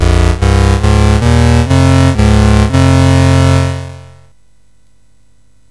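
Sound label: Mountain King, MOS 6581 SID chip music, games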